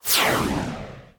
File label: comedy missle launch; sound effects one